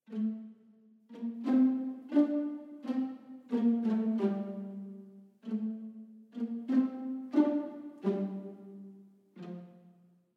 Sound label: Violas Pizzicato; Audio Imperia Areia